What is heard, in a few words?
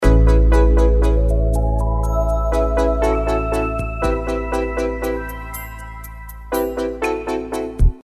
banner loop, musicloops